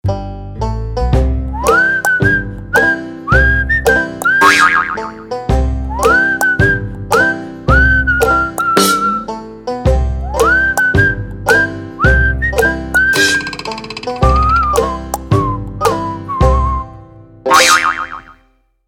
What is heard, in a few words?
Banjo, ringtones; Sony Ericsson; Banjo Barry